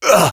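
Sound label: pain sharp, French; Sniper; Douleur aiguë 1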